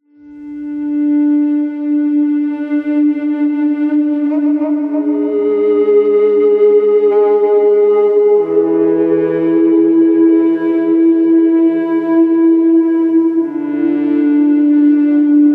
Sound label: TreeSeq melody; Perception; PS4